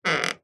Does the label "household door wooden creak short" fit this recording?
Yes